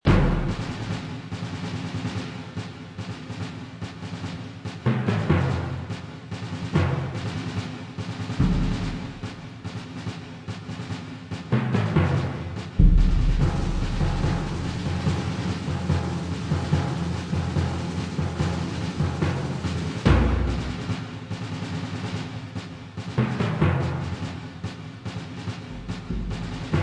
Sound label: Combat A Low; Tachyon The Fringe